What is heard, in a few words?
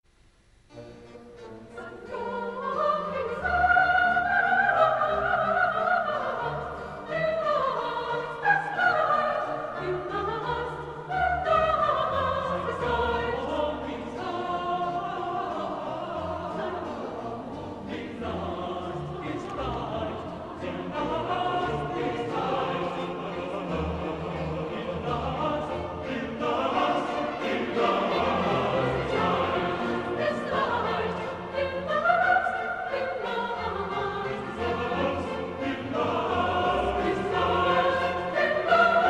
Sung in German; Messiah, HWV 56; No. 21, Sein Joch ist sanft